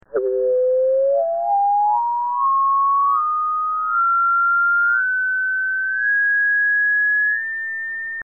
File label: DBR FP Tunable Laser Left + Right Facets; Light Rock